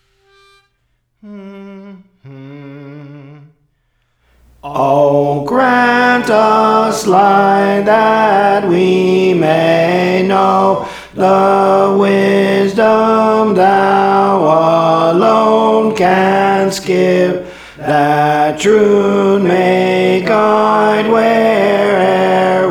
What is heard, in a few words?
Tenor; Praise For The Lord Training